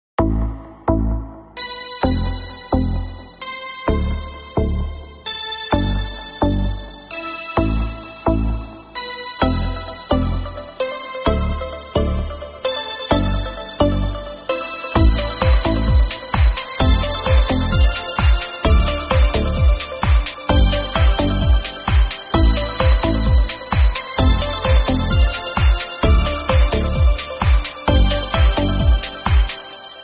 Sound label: Mobile Ringtone